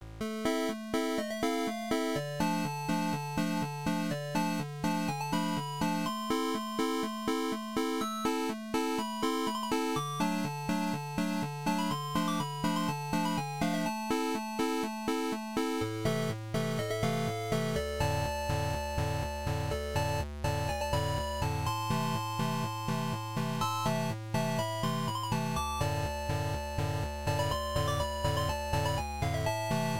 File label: 8Bit; Sherlock Holmes